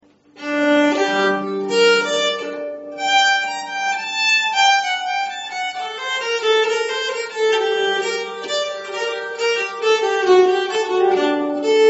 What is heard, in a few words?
Lent, nyckelharpa